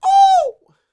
A YELL, MALE HUMAN; ILIO; Vocal Planet

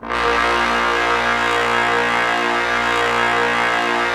BRASS A#2, STEREO BRASS; Greytsounds VOL-1

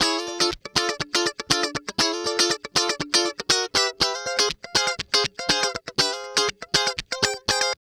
Guitar Licks

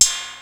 TR, drums perc, Roland

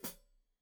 Hat Foot, Hi-Hat, Cymbals Stereo

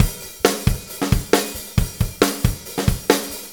Drum Tools, Loops; Sonic Foundry (Sony Creative Software); Various Loops

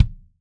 DW Green Maple Kick; Sonic Foundry (Sony Creative Software); Vital Drums

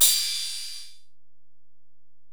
Ride, Alesis DM-PRO, Drum Machines

